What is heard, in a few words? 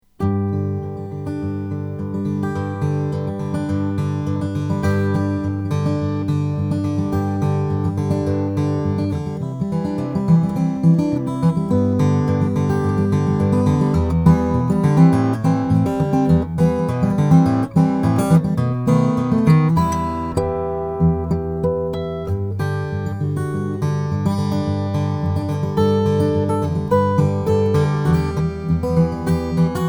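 froggy bottom sj mahogany, guitars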